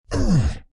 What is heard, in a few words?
mask grunt sound